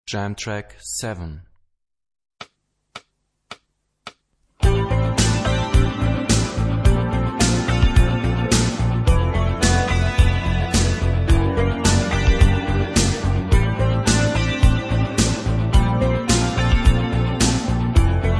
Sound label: Rock guitar; Rock gt Secrets